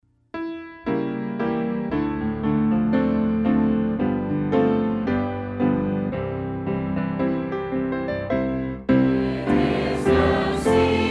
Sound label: Choir